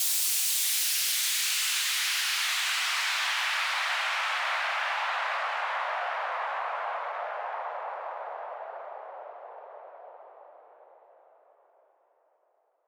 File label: Suit Noise; LD42 SARD inc new ship